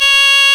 REED C#; Big Fish Audio; Synclav FM

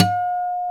GTR 6-STR, Steel String; Roland LCDP02 Guitar and Bass

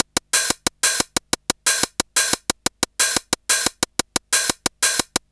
Hi Hat Loop; Sonic Foundry (Sony Creative Software) - Fluid Dynamics Drum & Bass; Construction Kit

